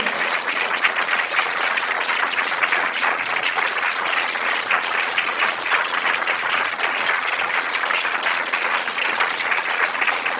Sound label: applause